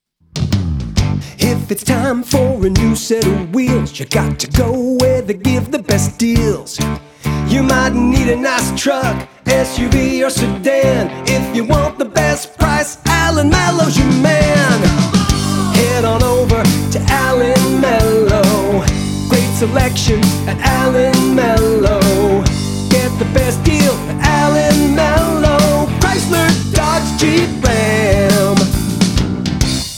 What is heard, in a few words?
Jingle